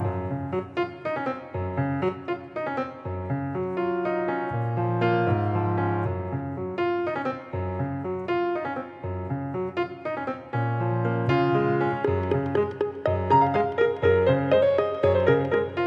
Music Level Together Loop

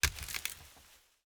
foliage break

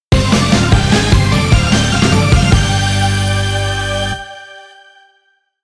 Boss Stage Clear; Ninja JaJaMaru Collection Original Soundtrack

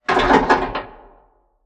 Payphone Hangup

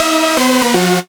Beat

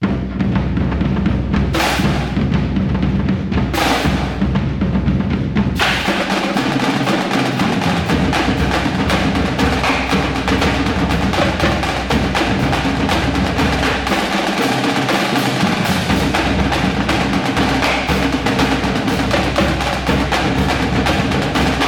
Fight Song

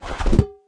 Menu Show

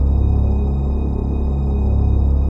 tone; netherworld before time i stand

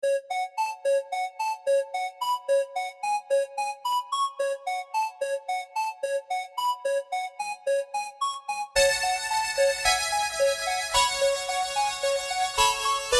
Eight Bit Jungle; Super Destronaut 2 Go Duck Yourself; Wii U

